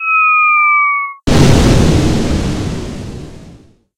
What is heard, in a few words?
Bazooka